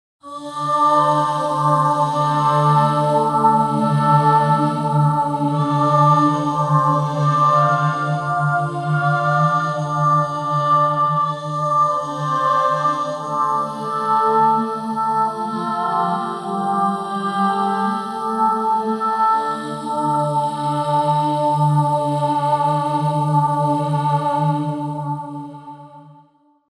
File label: Boy Choir; Raincastle